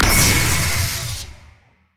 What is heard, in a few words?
shock blast; LoL; jayce